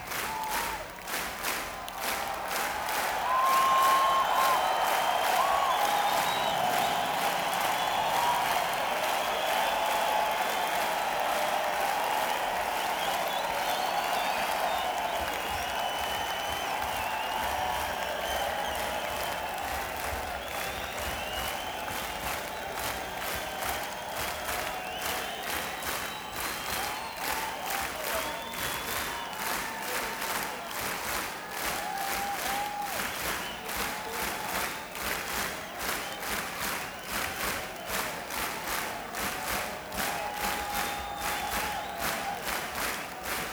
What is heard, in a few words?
Encore Break; akg451; neumann u87